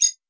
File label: click